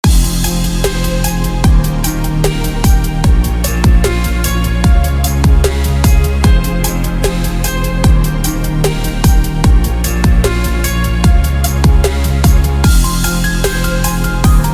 dubstep

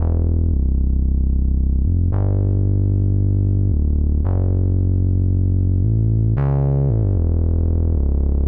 bass, 113BPM; Pony Fan Music Remix Stems

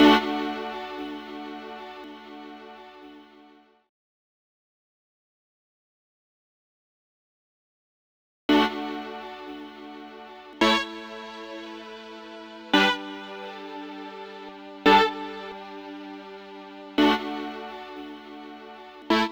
chord hits, 113BPM

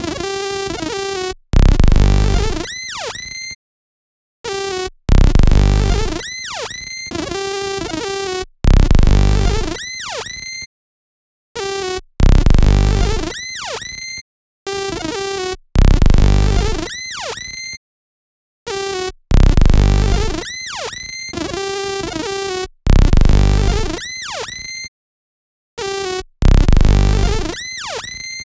bendy saw

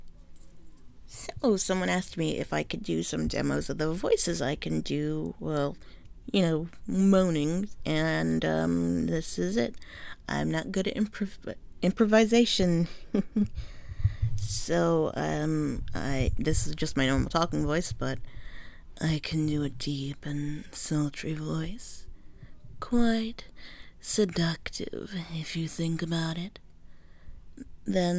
Vocal range

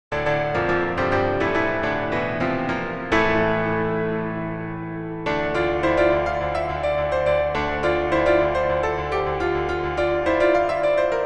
Piano Version; MlpLifegoeson; MLP Fighting is Magic Piano Collection

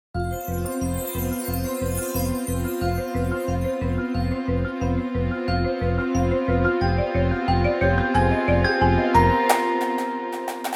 Once in a Blue Moon (Indoor Percussion)

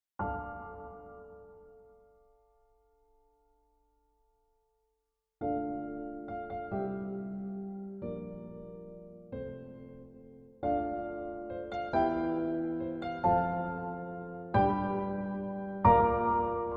(Piano Instrumental)

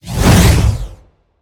Monster; Zenless Zone Zero

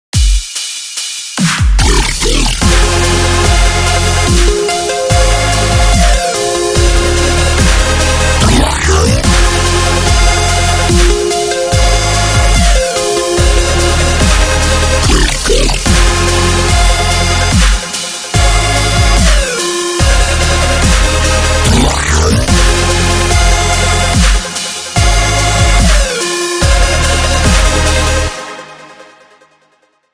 Melodic Dubstep Drop; Wubbix Tutorial; Pony Music Archive 21.03

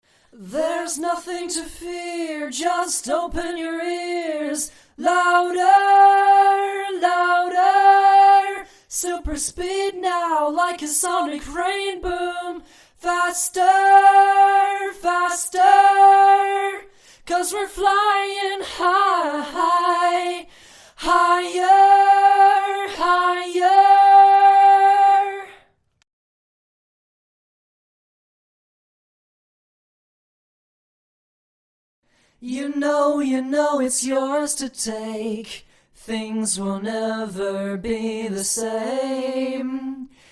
(Acapella); Pony Music Archive

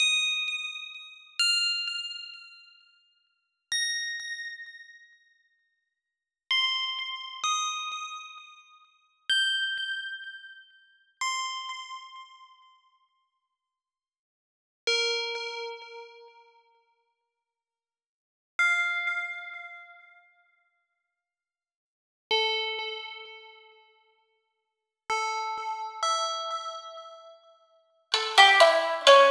bells intro, 129BPM; Pony Fan Music Remix Stems

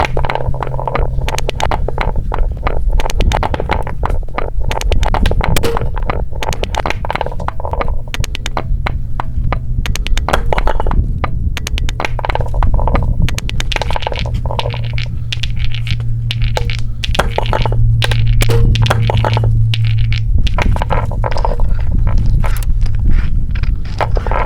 Breaking Hitting, rocks; just rocks; (for Maud Pie)

